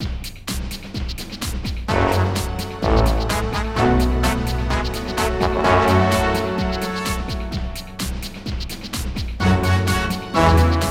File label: Music Gameplay Defeat Loop; Nickelodeon Extreme Tennis